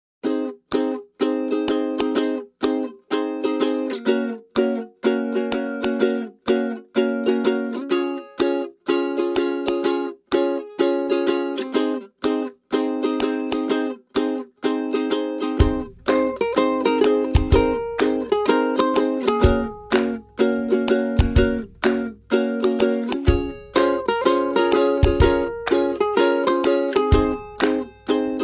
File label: Drums and Ukuleles Only